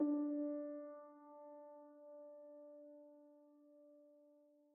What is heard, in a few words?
City Reprise